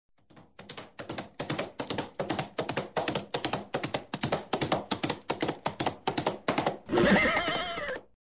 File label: gallop henni